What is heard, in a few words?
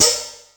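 H Splash, Hi-Hat, Yamaha DTXpress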